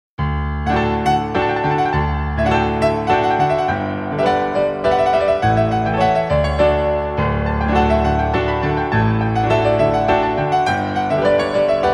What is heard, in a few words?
Violin